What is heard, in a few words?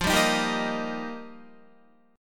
F 7th Suspended 2nd Suspended 4th, Guitar; F7sus2sus4; strummed; 13,10,13,12,10,10